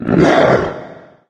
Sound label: mancubus chatter combat; doom3